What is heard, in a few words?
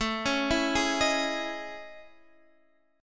A Major Sharp 11th